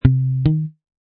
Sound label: Bass End A, Bass riff in A# at 110bpm.; Bass Loops (Royalty Free Music Loops:Music Production Loops)